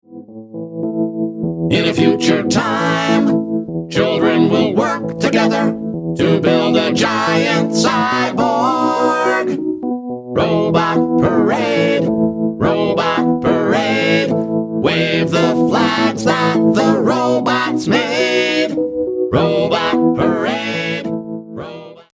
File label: Robot Parade